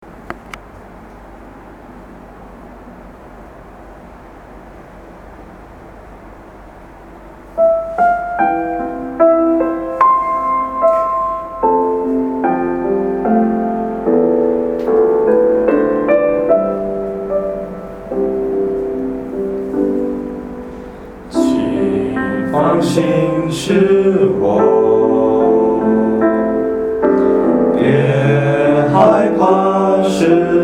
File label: group voices; 全球华语圣歌大赛; 第一届参赛作品